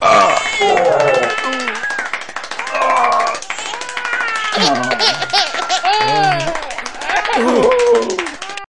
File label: crying; hero